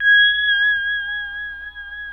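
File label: pedal steel swell G#6; PEDSTL SWELL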